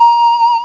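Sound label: Pan flute; musiccomposer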